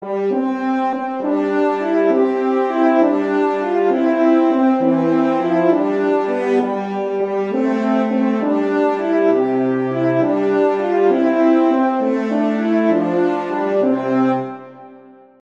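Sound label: Christmas Horn Duets; I Saw Three Ships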